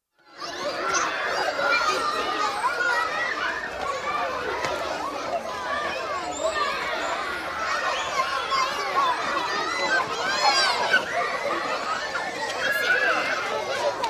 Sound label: Primary School, mixed junior playground atmosphere, one child occasionally cries in background; BBC